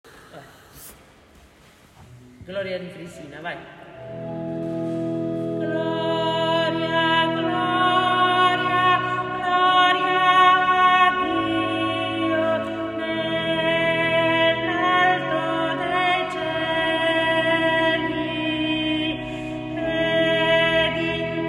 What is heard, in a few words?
Gloria, Contralto